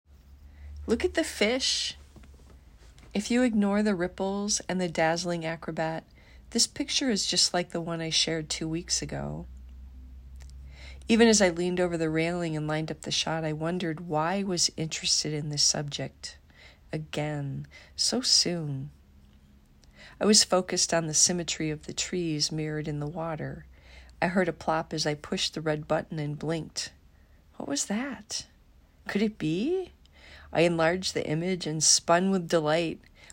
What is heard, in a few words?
meditation